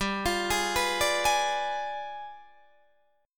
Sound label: G 7th Flat 9th, Guitar, Open D